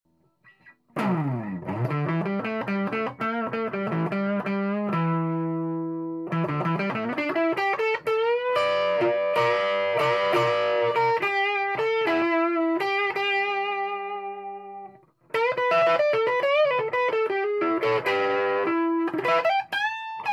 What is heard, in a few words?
es335; DIY; 60s ods